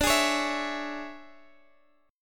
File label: C sharp Minor D; C#m/D; strummed; JGuitar